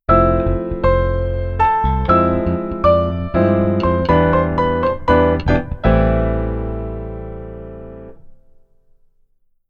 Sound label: Keyboard Solo Right Hand